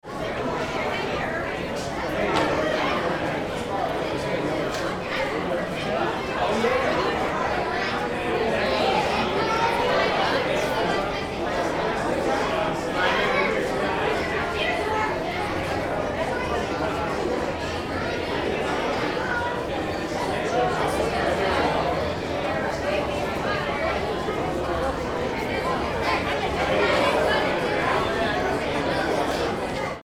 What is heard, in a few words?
Crowd Tourists Chicago, Group Of Tourists In The City; Group Of Tourists At An Outdoor Attraction In City; Crowds